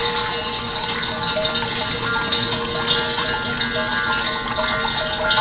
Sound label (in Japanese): suikin